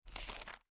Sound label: Page turn